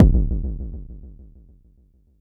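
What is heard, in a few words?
Mod bass drum, Jomox Airbase99, Drum Machines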